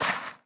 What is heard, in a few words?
sand footstep; adams venture origins